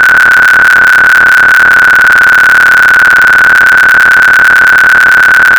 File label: unfiltered, BPSK Encoding; without the filter